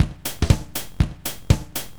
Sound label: house, drum; recreational research foundation